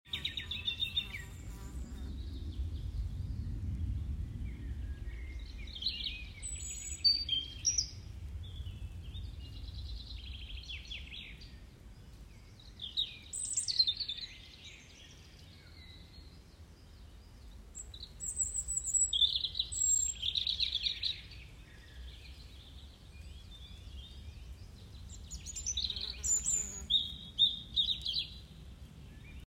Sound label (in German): Rotkehlchen